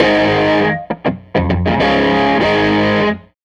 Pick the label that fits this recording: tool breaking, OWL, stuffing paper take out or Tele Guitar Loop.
Tele Guitar Loop